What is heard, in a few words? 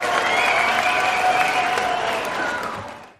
Crowd Cheers And Whistles